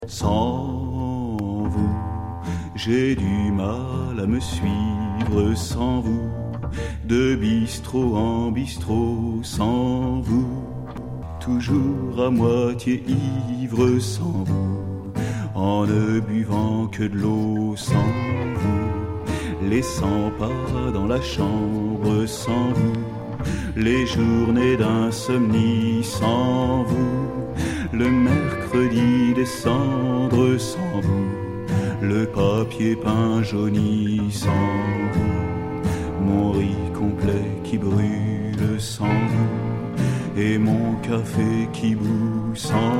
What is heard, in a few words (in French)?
Sans glots